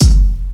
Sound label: kick drum one shot g key, kick sound tuned to the G note. Loudest frequency: 717Hz